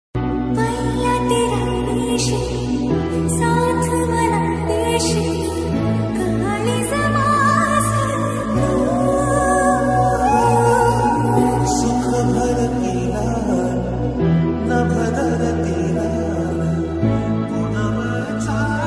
marathi ringtone bgm marathi ringtone marathi ringtone